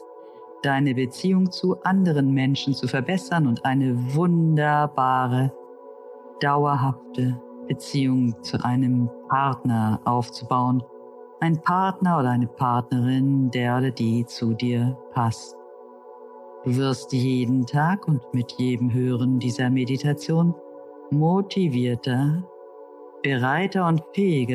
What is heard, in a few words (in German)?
Musik; Beziehung